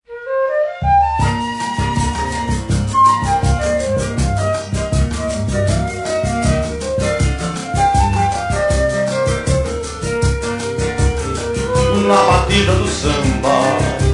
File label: Samba